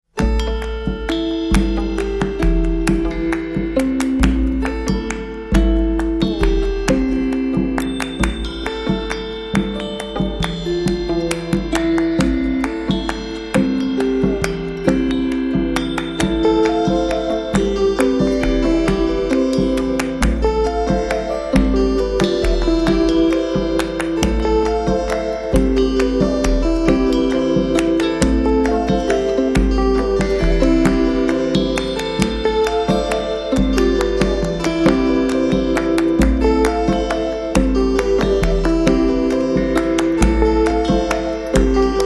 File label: Lyre Lyre